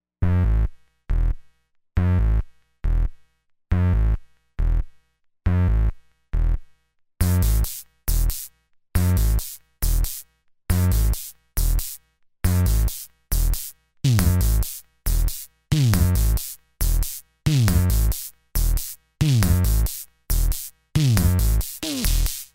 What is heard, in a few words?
raw beat quad analog drum synthesizer; dr zee workshop